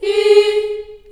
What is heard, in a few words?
G#3, Choir, CHILD; CHILD IHS